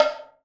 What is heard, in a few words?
PERC, 180 BPM; Ueberschall; Drum & Bass Resonance